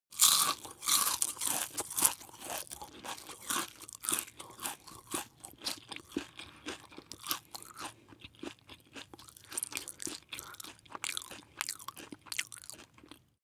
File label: chew biscuit smack one piece